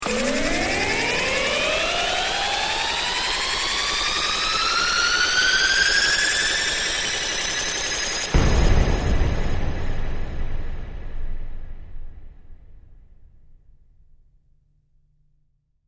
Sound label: Going Through The Roof, Futuristic rising sound ending with a bang.; Tech